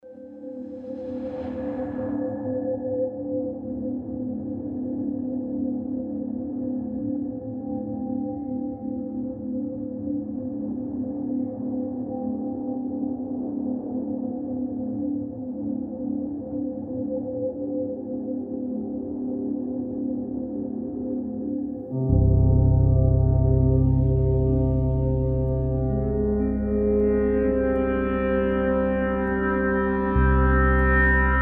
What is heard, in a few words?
ambiences geosonic horns